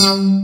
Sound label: BUG BASS; Pro-Rec - Synthsonic; BASSES